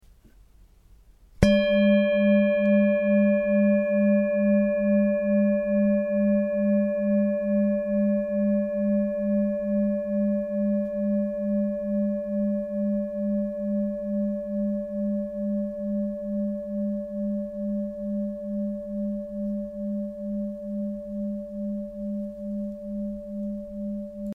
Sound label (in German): Klangschale; Chakren